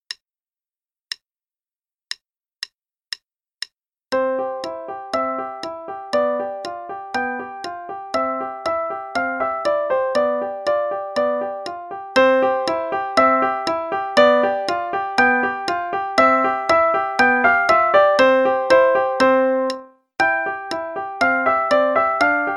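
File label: Play-a-long, quarter note=120; Berens; Frolicking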